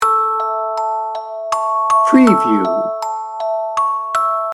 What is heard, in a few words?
brahms lullaby loop